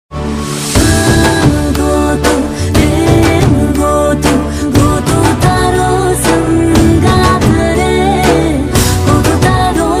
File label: gujarati ringtone